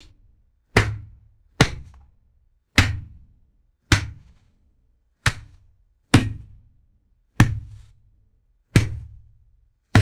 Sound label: dull metallic slaps on steel plate